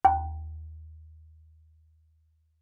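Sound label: Marimba cord fortissimo Eb4; MIS Pitches - 2014; Percussion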